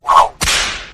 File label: horse whipped